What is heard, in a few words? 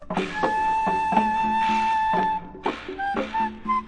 exotic perc, 119; SAMPLED MUSIC LOOPS